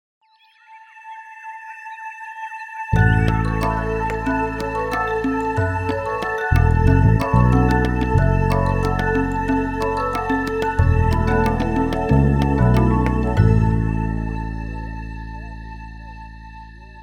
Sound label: forest jingle